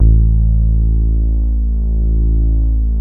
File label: BASSES